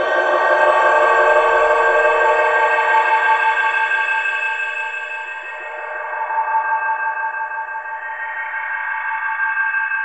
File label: Weird FX, Underground UK House & Electro; Sonic Foundry (Sony Creative Software)